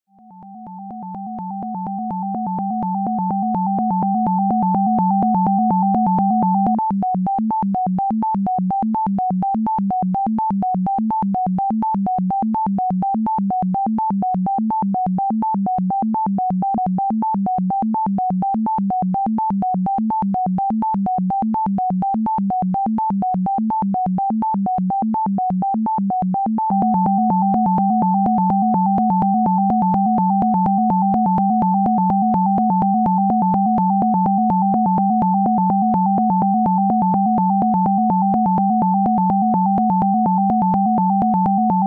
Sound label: cambiata illusion pan; with each channel played alone for a short time; This is a stereo sound file; Deutsch's Cambiata Illusion